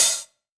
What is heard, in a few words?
HH, Drum Mashines; EdgeSounds